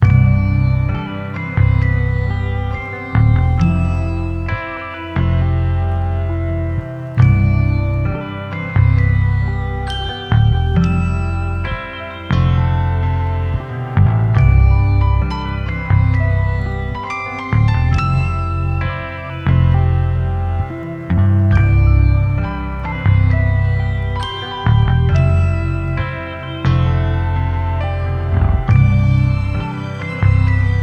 Piano, Bass Guitar, Strings, Horn C 134bpm Vintage Vinyl